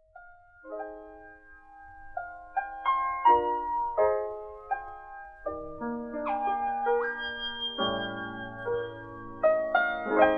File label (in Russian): instrumentalnyiy ansambl